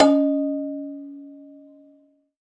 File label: Rubbery Piano, prepared piano; Total Piano; Rubbery Piano 2 -ped